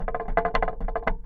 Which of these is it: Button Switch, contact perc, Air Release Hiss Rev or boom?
contact perc